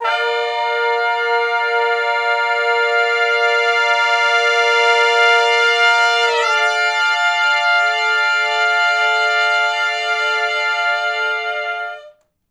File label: Slo Minor Blus Chords ((IV,IV,I,I) (D); Sections; Crimson Blue and Fabulous Horncraft 4 RnB; Horncraft for R&B